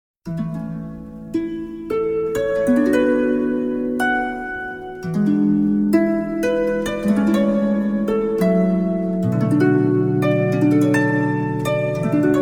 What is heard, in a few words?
harp